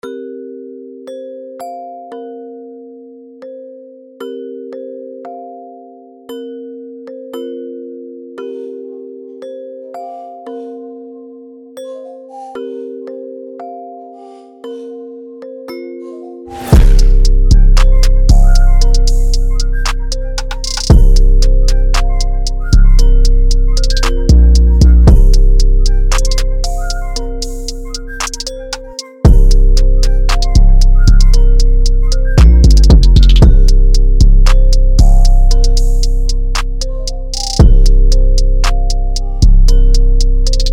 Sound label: Chill Beat high beat; ROST